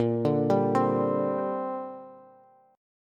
Bb 6th Add 9th Bb Bass; Bb6add9 arpeggiated

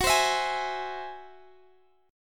Ab Augmented 7th Gb, guitar

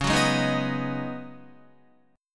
Db 7th Suspended 2nd Suspended 4th Db Guitar; Guitar-4F,4A,5C,5G,5C,6E; Db7sus2sus4 strummed

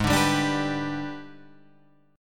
Gsharp Minor Major 7th Flat 5th, Guitar, Drop D; 6,5,5,7,8,7

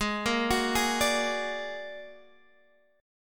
Bb Augmented 7th Ab, chord

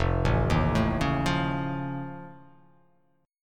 F Augmented 7th F, chord

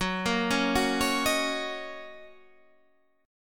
Fsharp 6th, guitar chord

